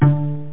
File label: pluck, net instrument; Internet 1996 World Exposition; BrainOpera; net-music